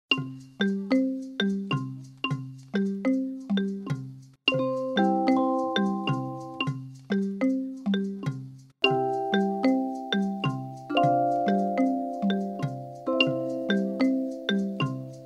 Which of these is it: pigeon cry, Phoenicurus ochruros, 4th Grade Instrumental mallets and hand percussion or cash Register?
4th Grade Instrumental mallets and hand percussion